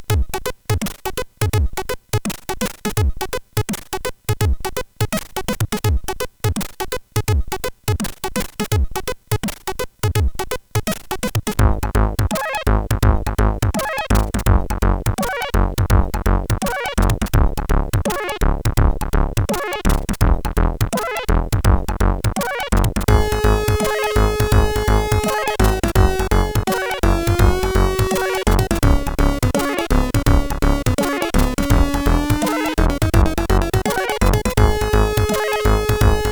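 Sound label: Funky, MOS8580R5 sid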